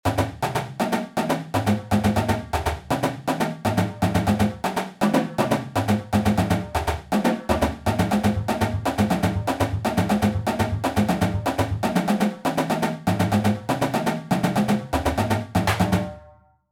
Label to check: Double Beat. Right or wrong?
Right